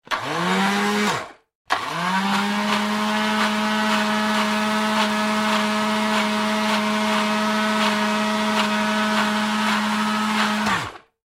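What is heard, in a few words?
blender and juicer sounds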